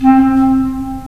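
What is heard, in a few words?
wind clarinet; Roland - LA Composer vol. 3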